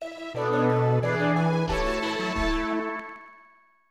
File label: Atlantis Realm Fanfare; Glover; Nintendo 64